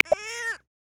Brown Pelican, Galapagos; Xiaomi Redmi-8A

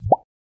Email Sent

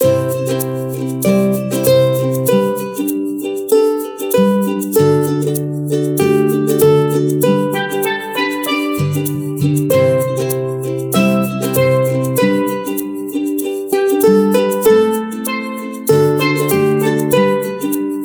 Pleasant Ukulele; LG K92 5G; ringtones

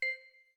blind lock; Samsung Galaxy Tab 4 7.0 3G

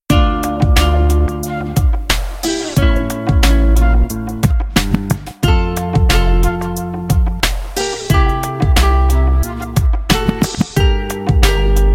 Sarabande; Samsung Galaxy Folder 2

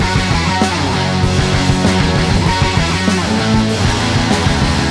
ROCK TONE, ringtones; Google; NewWave Labs

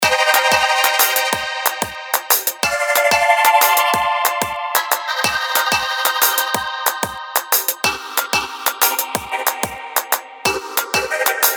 RING ATMOSPHERE; HTC; Ring Tone; E-Club Ring Tone Package 1

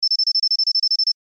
low power ringtone; Samsung Galaxy S8

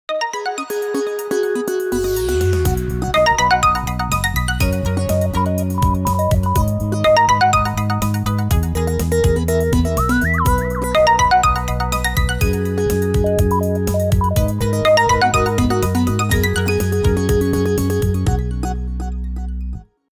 Xtreme Tone, ringtones; Vivo X80-Pro

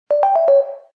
Message tone in Call; LG T500 Ego

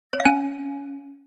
Jingle, notifications; ZTE Grand-S-2

